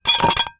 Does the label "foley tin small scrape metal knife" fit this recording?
Yes